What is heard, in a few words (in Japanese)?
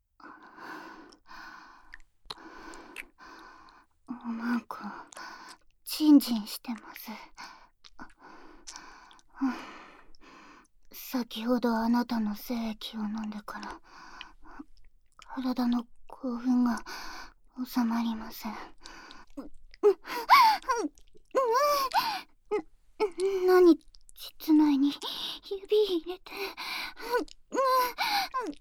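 耳舐め・ディープキス, ASMR; 催眠サキュバスのじゅるじゅる耳舐めとドスケベ騎乗位; 手マン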